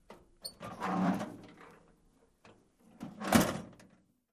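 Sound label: 1936 Ford Door Open Close, ford truck old v 8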